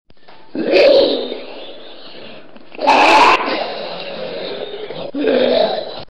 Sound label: goblin noise